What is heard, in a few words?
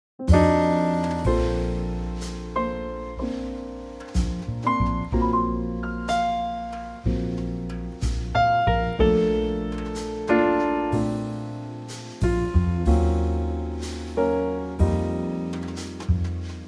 Bb Trio